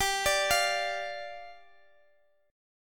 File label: D Minor Double Flat 5th, G